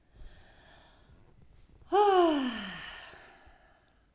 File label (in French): soupir; Index des soupirs; Hadjithomas Joreige